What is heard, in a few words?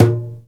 Congas, Latin; Roland L-CD701; PRC Latin 1